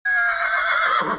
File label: horse; TamTamMicro.activity